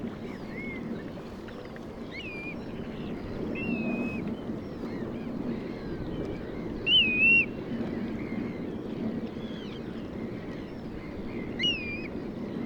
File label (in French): Pluvier argente Pluvialis squatarola, oiseaux de mayotte; oiseaux cotiers et marins; faune de mayotte